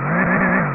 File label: PowerTool; Mangled Fenders